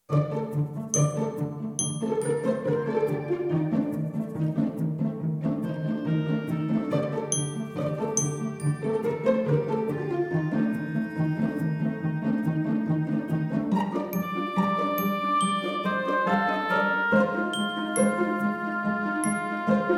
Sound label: Woods Comedy